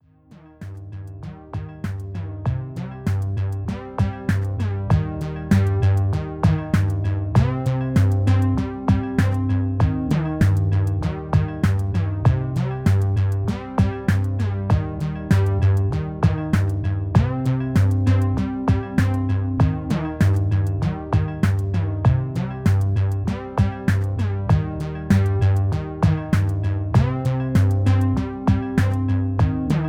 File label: classic analog